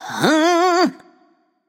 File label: nv confused; cupid